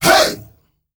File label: "hey", world voices, afro; ILIO; Vocal Planet VOL-5; AFRO NOISE